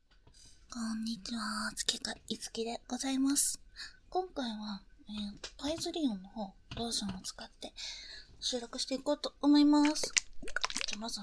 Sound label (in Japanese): ローションパイズリ(バイノーラル)